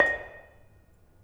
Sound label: Bonang Panerus hard, gamelan